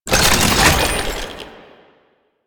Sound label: Concrete Archgun Reload; Warframe; Archwing